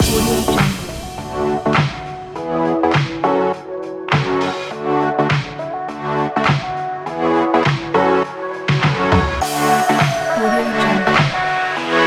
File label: dance funk